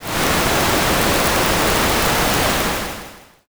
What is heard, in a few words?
fire